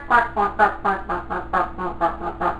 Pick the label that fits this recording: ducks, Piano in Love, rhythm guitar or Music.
ducks